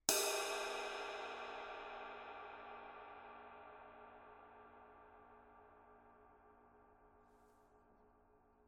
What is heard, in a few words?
22 dark crash ride body, paiste; masters